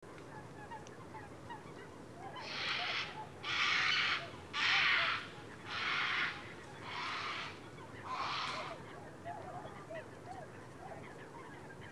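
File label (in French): héron cendré, ardea cinerea; retour en camargue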